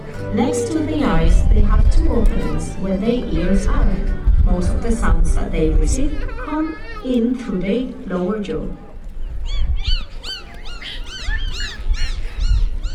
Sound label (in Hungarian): palackorrú delfin Lanzarote; allathangok